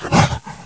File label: fall